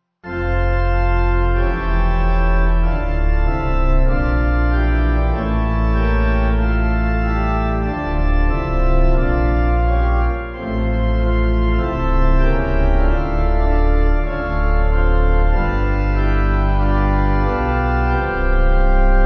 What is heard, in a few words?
Pipe